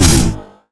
Snake Fire

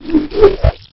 Spawn; DanishUT

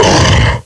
Pound Pain

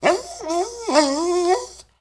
dog whimper; animals

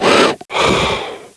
Stalker Roar

